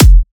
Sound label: drum kick compactor; Virtual Riot; Drum Hits